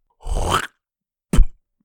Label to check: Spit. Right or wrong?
Right